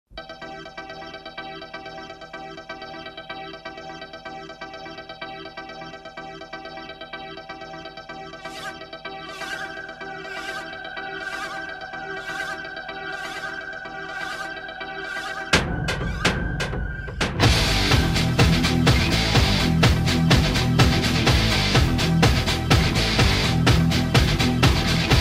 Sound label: instrumental